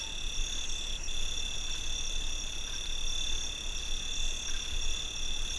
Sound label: night normal; ambience stereo